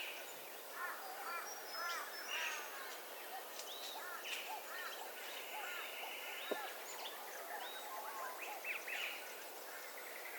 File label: soundscape hillview